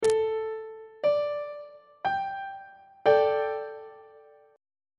f double sharp suspended 2nd 1st inversion on treble clef